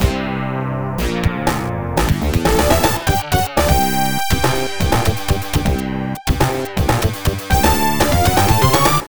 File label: Match Start